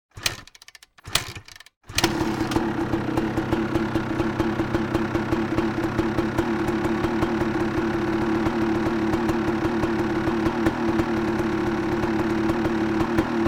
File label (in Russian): Zavodyat motoccikl